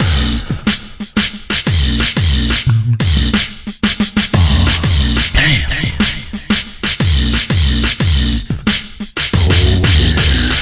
damn, Beatbox; Drum loops

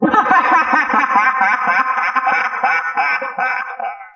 laugh maniacal man; ooh ahh

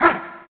ha, Voice, ooh ahh

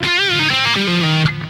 quitar squealing

